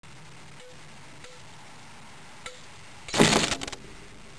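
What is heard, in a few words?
glass break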